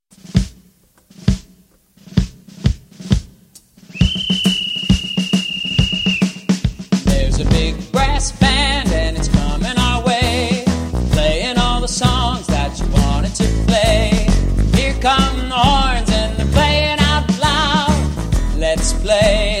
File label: Big Brass Band